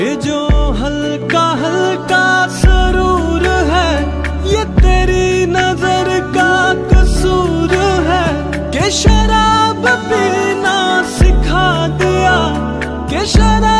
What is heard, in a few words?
Bollywood Song Ringtone; New Bollywood Movie Padmavati